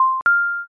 intercom

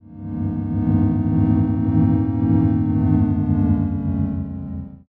chatter

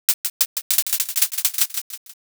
sparse clap

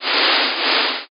towel

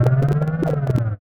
lava beast

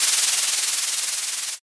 drawing

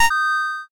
judge perfect

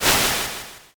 swoosh

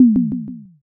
tom

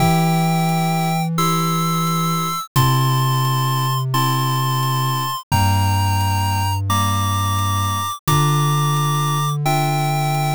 bgm mellow

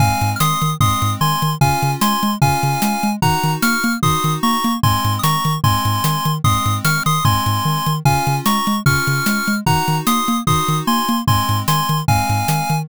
bgm street

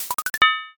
transform mech

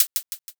hihat

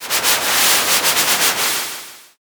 river

